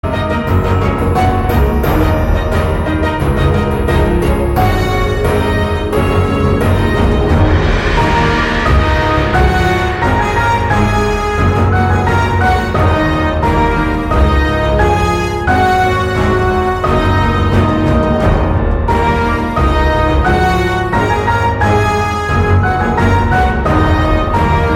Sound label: Orchestration; Orchestration One; MIUI12